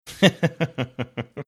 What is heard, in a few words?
chuckling